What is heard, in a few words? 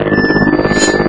blade loop, manhack